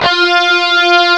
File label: GUIT F4; AKAI S-Series CD-ROM Sound Library VOL-2